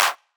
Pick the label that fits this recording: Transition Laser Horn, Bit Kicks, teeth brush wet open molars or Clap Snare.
Clap Snare